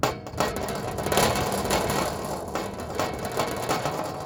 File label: metal rattle